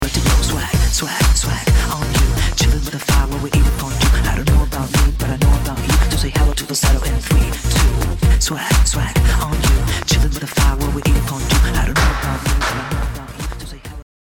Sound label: BPM128